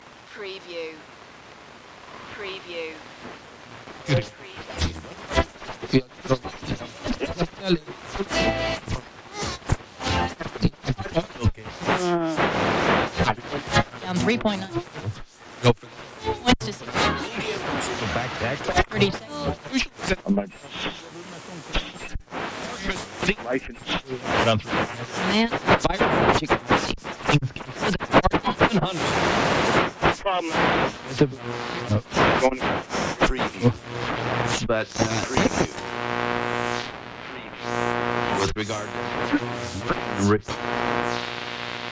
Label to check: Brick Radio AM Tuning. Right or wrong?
Right